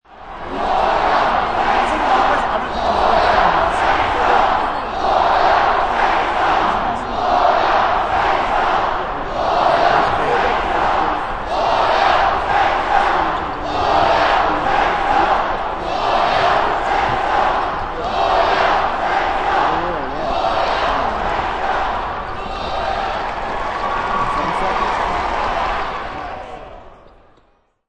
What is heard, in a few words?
Georgetown University basketball fans yell hoya saxa; Arena Sound Effects